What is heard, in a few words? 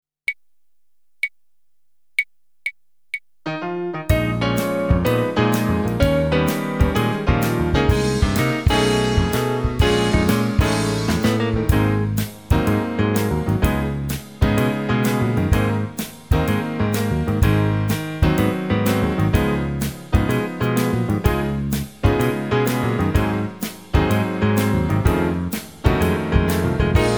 Bebop Tune